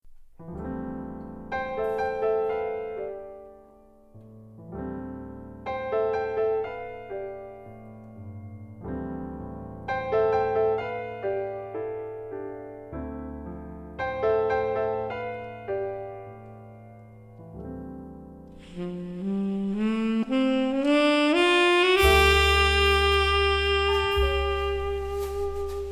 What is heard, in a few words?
ravenscroft 275; VILabs